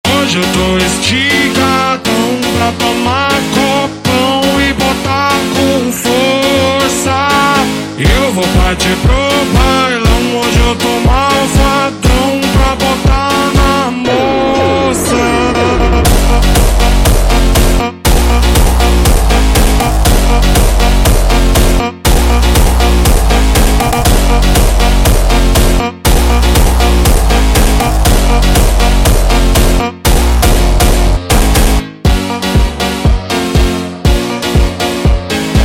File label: Jumpstyle slowed